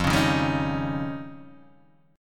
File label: F 7th Suspended 2nd Sharp 5th F Guitar Open D